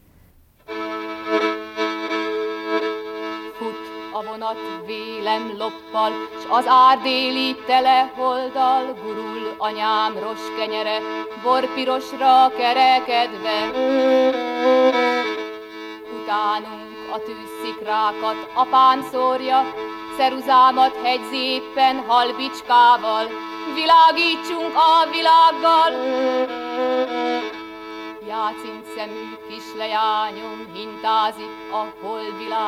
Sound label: viola